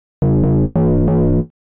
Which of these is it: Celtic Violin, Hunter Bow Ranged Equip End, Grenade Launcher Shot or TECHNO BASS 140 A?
TECHNO BASS 140 A